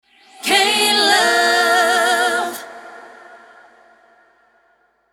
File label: Sung Jingle 97bpm A Minor ACAPELLA; KLove